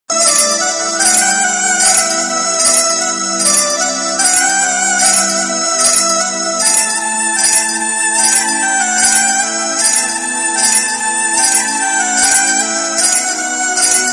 instrumental ringtone; major saab

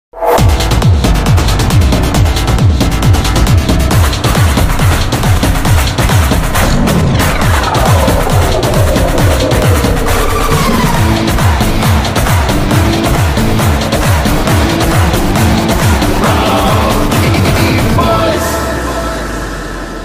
villan beats; rowdy boys; first look

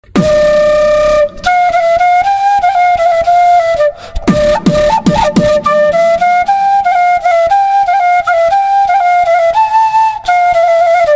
flute beatbox

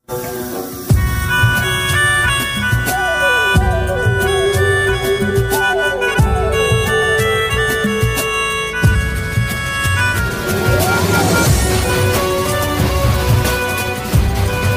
instrument ringtone; Hero movie 2015

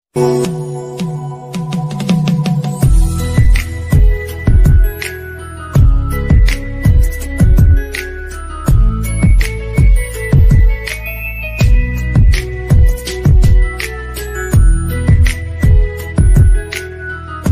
instrumental ringtone song ringtone

